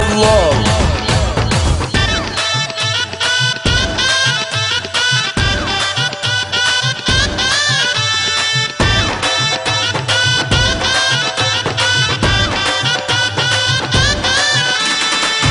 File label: Tune 100 Love